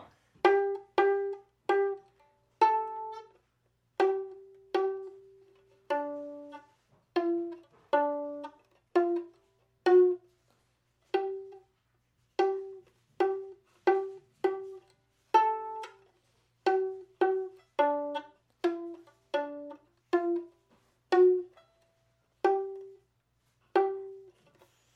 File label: violin; Xander McCoy; Good King Wenceslas; Christmas